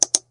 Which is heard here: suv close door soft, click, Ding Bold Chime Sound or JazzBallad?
click